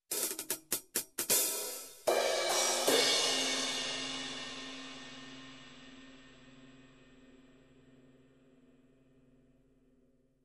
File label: Hi Hat and Overhead Fill